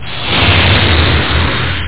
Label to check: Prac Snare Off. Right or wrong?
Wrong